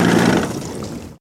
115 hp Evinrude Boat Shut Off Mono

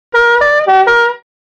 Horn Fancy Windtone H, foreign

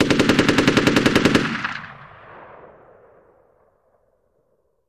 Machine Gun Sngl Rap, single burst

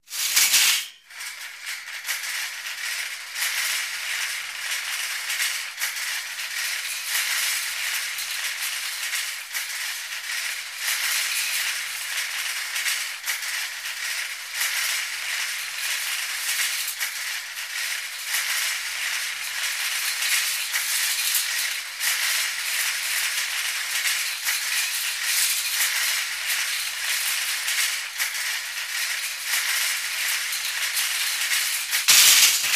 Shopping Cart

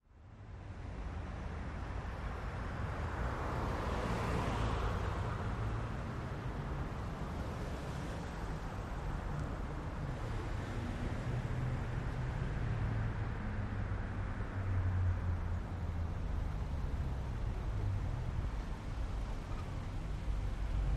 city traffic light, car truck bys

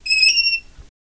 Faucet Squeak Metal

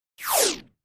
Space Door Lock, doors high tech

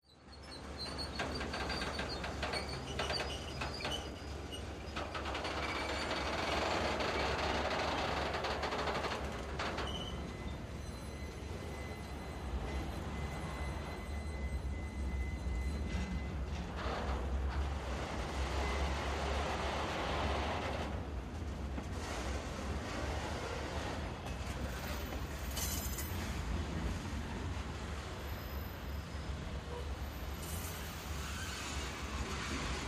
Train By Slow, bys

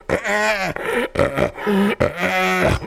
Hyena Gasp Exhales

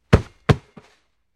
Football Heavy Hit B, football

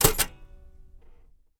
Typewriter Shift Ke

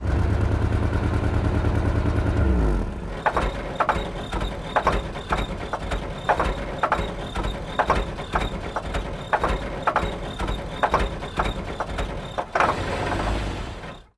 Car Engine Die Diesel

